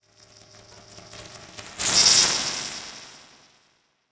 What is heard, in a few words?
Transition Reverse Surge Reverse; Production Element